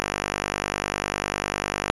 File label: 125bpm 5-4 1bar; MidroSync